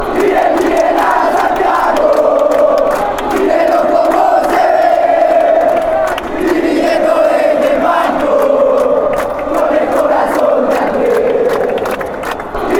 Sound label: chant, colombia; atlctico nacional